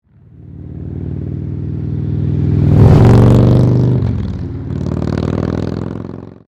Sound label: FLYBY